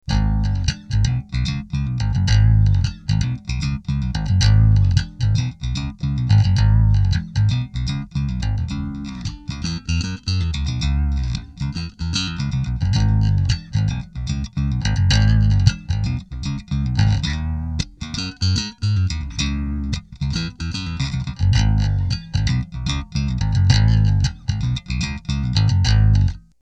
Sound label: riff